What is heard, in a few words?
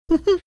cute laugh